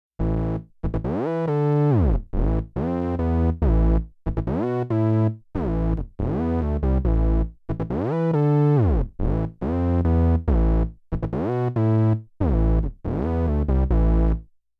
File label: Bass Moog One